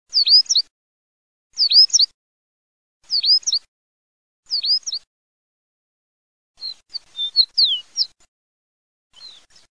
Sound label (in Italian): LODOLA CORNUTA, birdsound